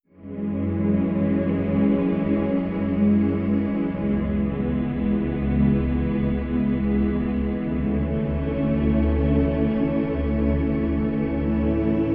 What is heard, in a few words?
pad 65